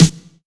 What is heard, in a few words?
Claps & Snares